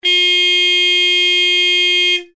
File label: heavy tone horn high; hella